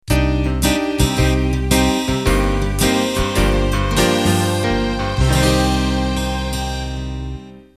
70s Radio Pop End

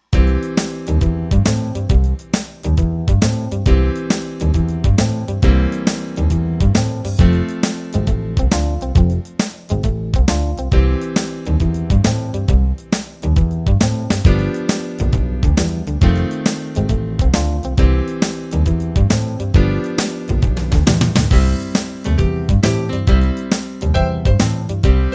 50 Rok

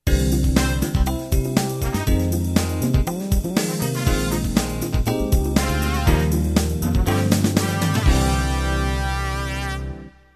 Acid Jazz End

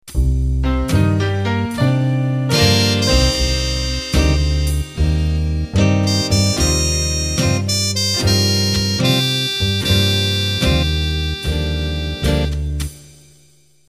Band Intro

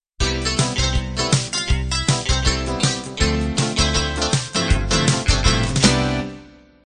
60s pop end

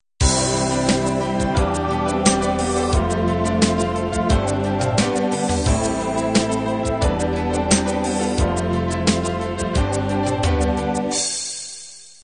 cinema ballad intro

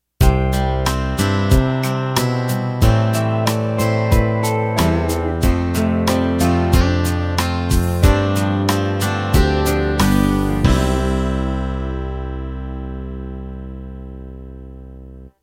Country Ballad End

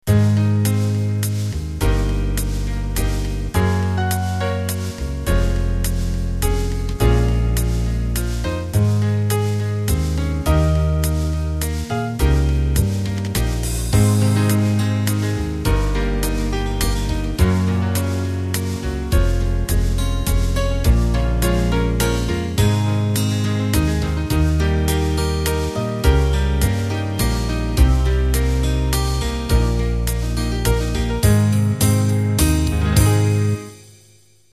Waltz

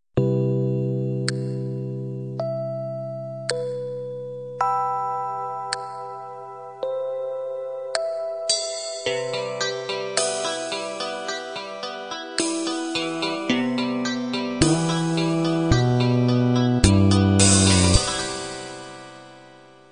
halftime ballad end

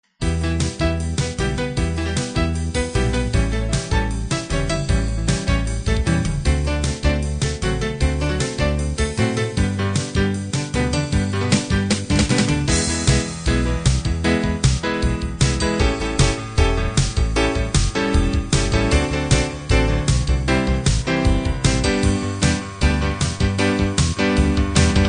piano rock

